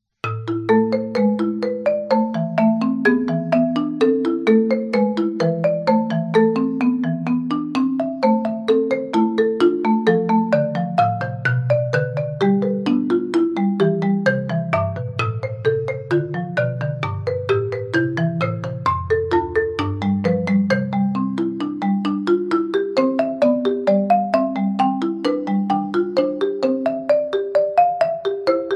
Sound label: BWV 784 Invention In A Moll, Mallets; Bach for Mallets